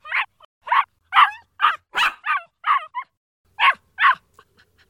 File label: puppy barking